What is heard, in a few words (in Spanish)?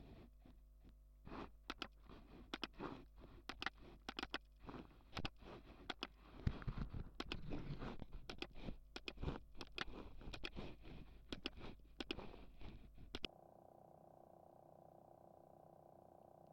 raton y clicks